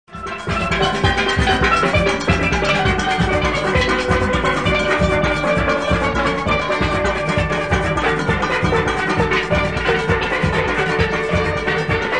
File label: Blow Pluck Hit